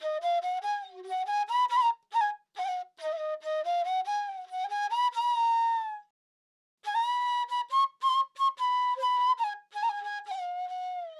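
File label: Piccolo Flute G minor 140 BPM, Dancehall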